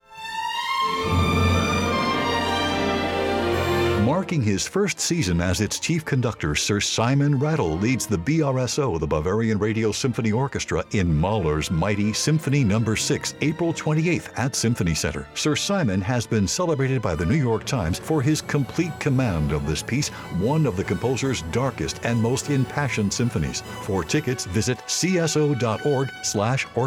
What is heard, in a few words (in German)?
Symphonieorchester; BRSO Symphonieorchester des Bayerischen Rundfunks